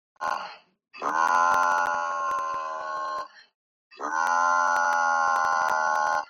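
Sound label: Samsung vibration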